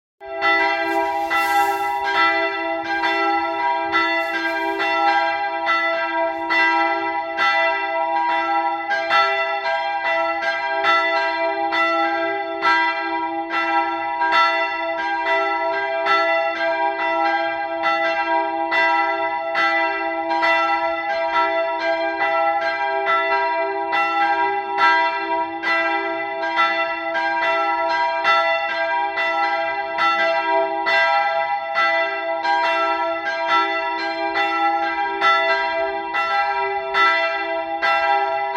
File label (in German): Glockenläuten Rafaelkirche